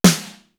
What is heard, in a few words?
STANDARD, TOM; East West - Global Sounds; D4 BD SD TOM